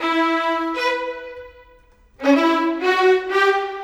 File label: Violins; Sonic Foundry (Sony Creative Software); Orchestral Series VOL-4; Rock and Pop; Rock-Pop 19